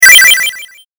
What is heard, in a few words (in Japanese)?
8bit 回復